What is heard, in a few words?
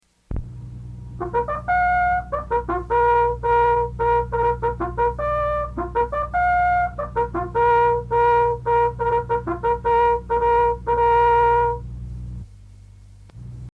Sick Call, bugle calls